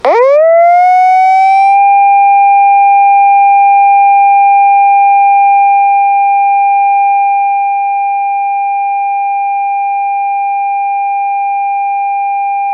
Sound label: siren level4; bosai